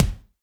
BEAT KICK

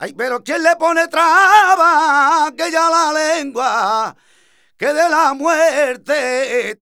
voc f, Flamenco Vocals Male Cantaor

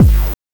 BANK1 BD, NES DPCM; MIDINES

